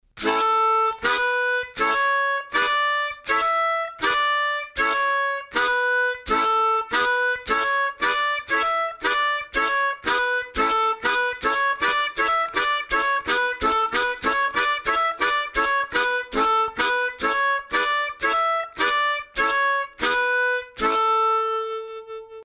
Slap Ta Melody, harmonica

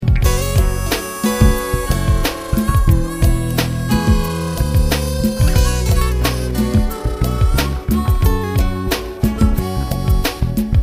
tongue roll, harmonica

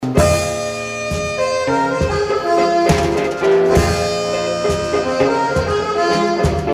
Fake Octave Diato, harmonica

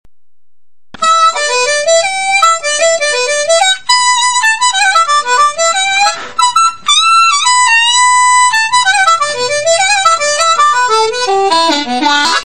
minor, harmonica

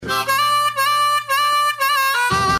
Dip Bend, harmonica

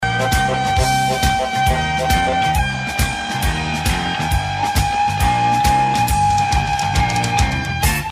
Growl, harmonica

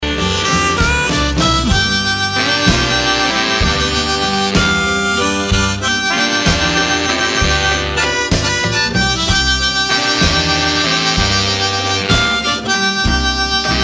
Octave, harmonica

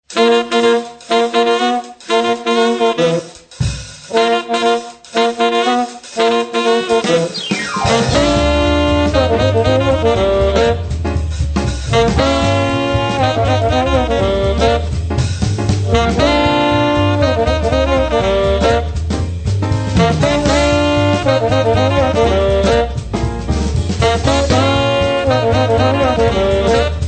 Blues Up Down, harmonica

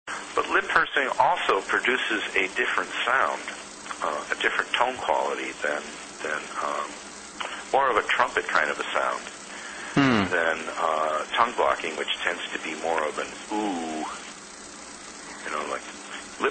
Pucker vs Tongue Block, harmonica

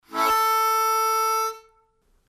4 Blow Slap, harmonica

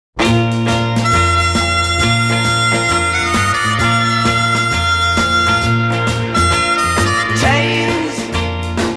harmonica

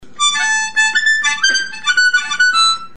tongue switch without, harmonica

